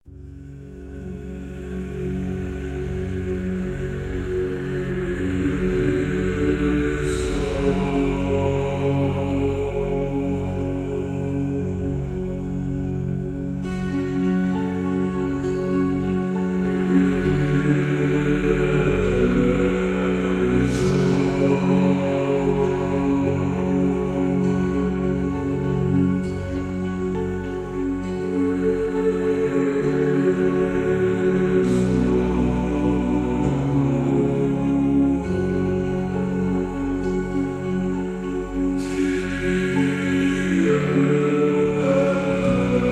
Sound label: Kyrie Eleison